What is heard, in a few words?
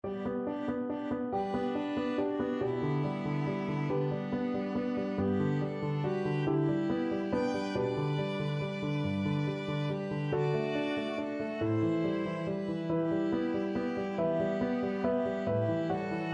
violin viola duet